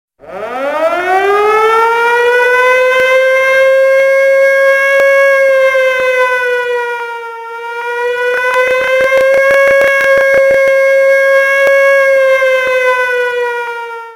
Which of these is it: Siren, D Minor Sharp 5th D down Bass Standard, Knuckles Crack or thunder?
Siren